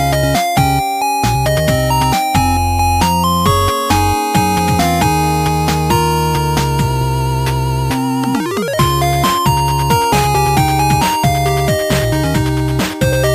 8 bit theme

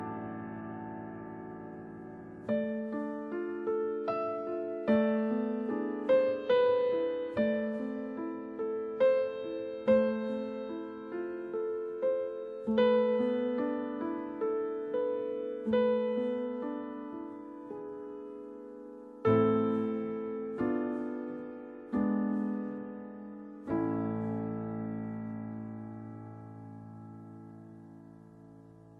piano slow; games; ringtones

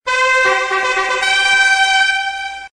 Ding

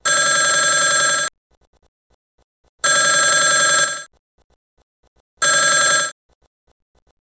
Classic old Ringtone

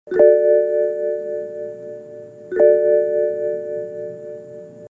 bmw gong chime

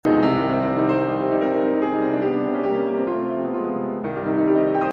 Piano Boogie Woogie

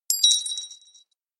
Nokia Coin

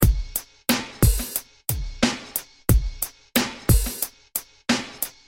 sms drum; alternative

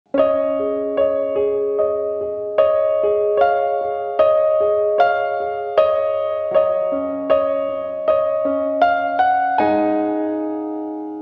piano

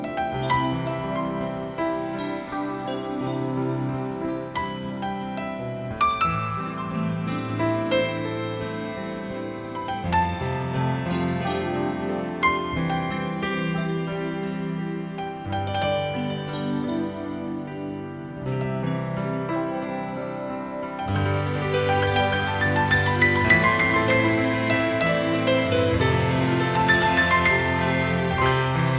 music

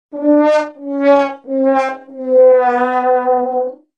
sad trombone; alternative

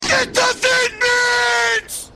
Thin Bells

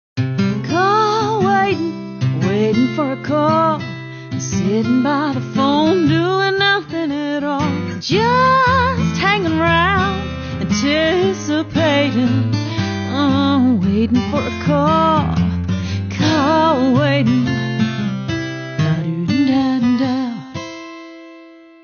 new call tone; alternative

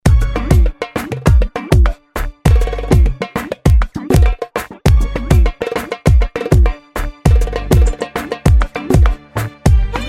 Dance Violin

